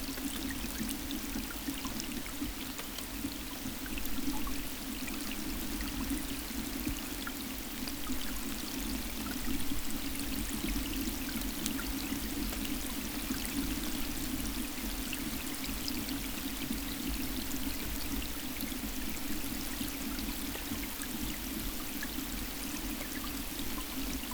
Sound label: Rain